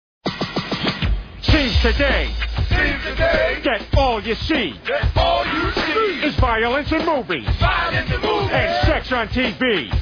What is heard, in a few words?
Rap Style; Family Guy